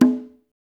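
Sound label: conga, left; Spectrasonics; Liquid Grooves 1 & 2; CONGAS+BGOS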